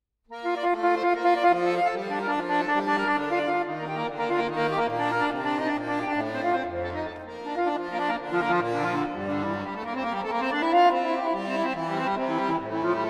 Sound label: Prelude in B flat major